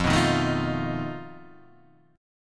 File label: F 7th Flat 5th F down